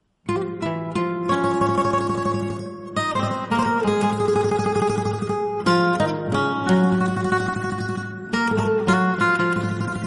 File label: Guitar Cover Song